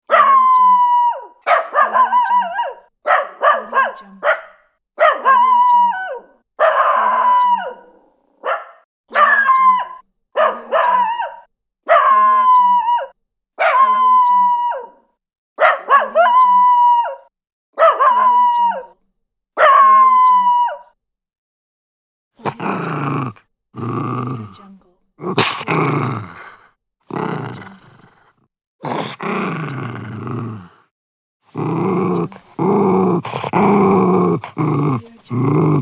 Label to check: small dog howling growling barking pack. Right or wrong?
Right